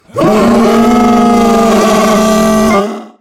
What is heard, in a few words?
Monster